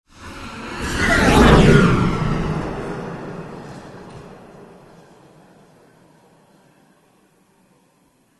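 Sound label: comet sounds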